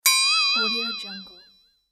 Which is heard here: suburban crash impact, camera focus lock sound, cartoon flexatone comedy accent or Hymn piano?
cartoon flexatone comedy accent